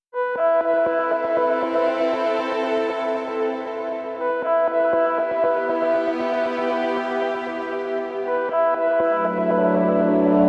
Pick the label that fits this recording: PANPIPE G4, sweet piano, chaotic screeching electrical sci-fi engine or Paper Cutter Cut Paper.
sweet piano